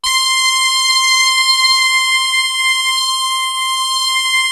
BRASS KIN, MORPHEUS; Syntec; Wall of Sounds

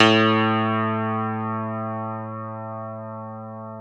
PNO, Electrics, Grands; CP70; Club-50 - Foundations Roland

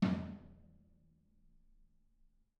R B Floor Tom A Room; Toms; Sonic Foundry (Sony Creative Software) - Drums from the Big Room The Mixes; R&B One Shots

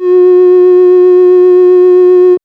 Moog C 3 -R; AMG - Vince Clarke Lucky Bastard

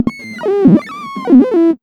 FX, Big Beat Drumloops; USB Soundscan vol.25; AKAI

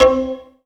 WOODEN C4; Q Up Arts; Dream Experience

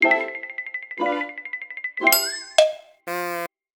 TipToes, cartoon caper

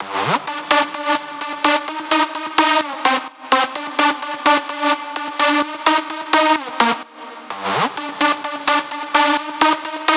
128BPM Synth Lead Main Wet SC, Root G; Vengeance - Essential Clubsounds Vol.5; VEC5 Demo Constr Kits; Early Morning Kit

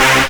Synths C; Vengeance - Essential Clubsounds Vol.2

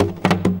GUIT M KNOCK; Best Service; World Colours

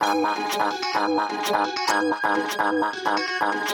128 G# Dry, MELO + FILTER; Android; RoBKTA Sample Pack Supreme

